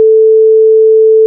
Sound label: boop; DuckHunt; audiosprite